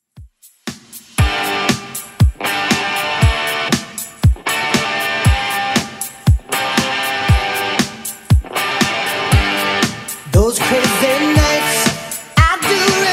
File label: 80s Rock Redrum 118bpm